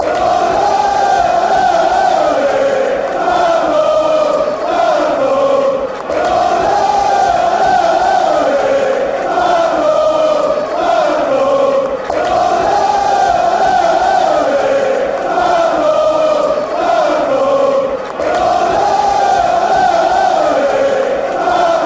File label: ole ole ole ole, fanchants